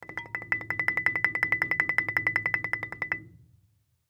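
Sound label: Marimba roll fortissimo B6; MIS Pitches - 2014; Percussion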